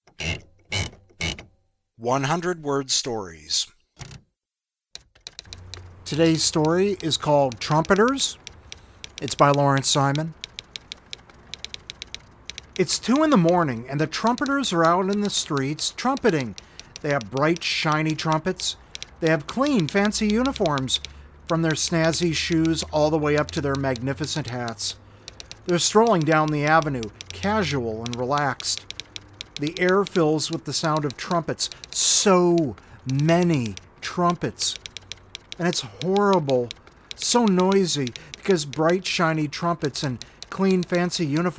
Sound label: trumpeters